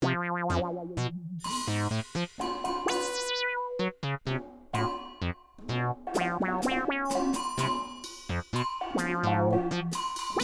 Cha Cha Cha

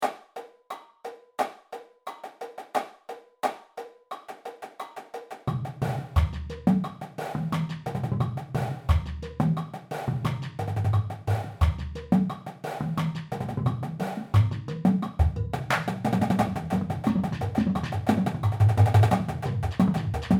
Singlestroke Pyramid

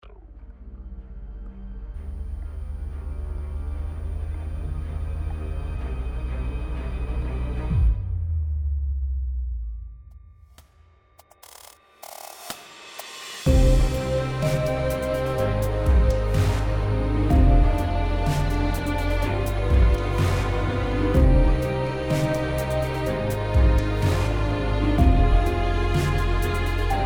Epic Trailer

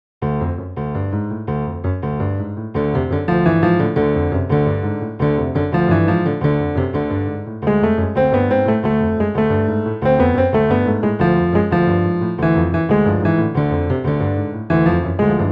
Macedonian Mountain Dance

